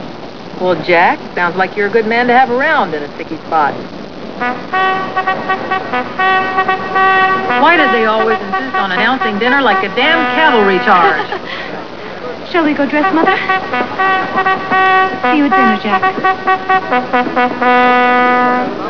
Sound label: cavelry